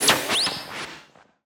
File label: Sci Fi Failure